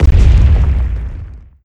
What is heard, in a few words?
9ball Shatter